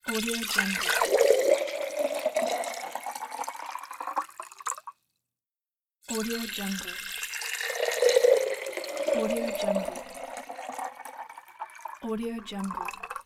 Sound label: pouring liquid into glass